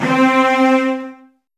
Virtuoso Strings, TRM FX

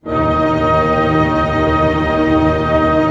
TREM D# PP, ORCH TREM; Sound On Sound - Peter Siedleczek's Orchestra

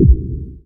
BD, Dance Mega Drums; Best Service

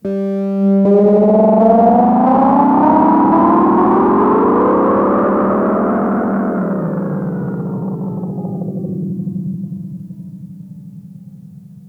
SCARY RHO; PlatinumPhattCD2; E-MU Producer Series Vol. 8 – Platinum Phatt

